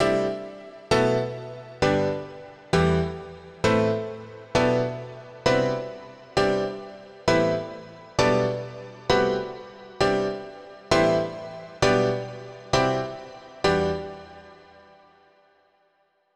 Dance Piano; super crush k.o.

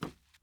wood step; teardown ps5 windows xbox series xs gamerip 2022

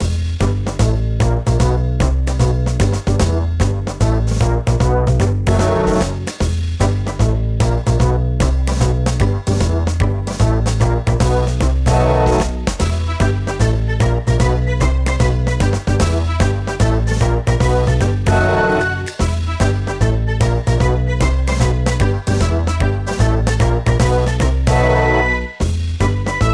BGM Cube; wicked monsters blast hd; wii u

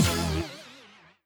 Error; Inkopolis News

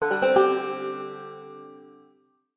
banjo chord; Summer Catchers

